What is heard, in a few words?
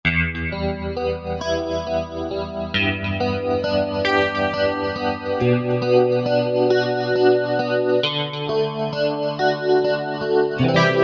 Twin Strats, Roland JV-2080